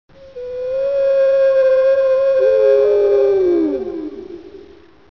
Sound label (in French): baleine